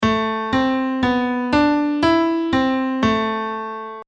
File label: Minor Scale Piano